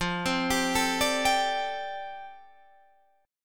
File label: F 6th Add 9th F down Guitar Open D, chord